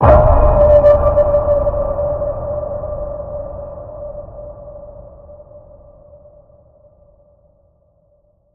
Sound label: Deep Waters Ping, D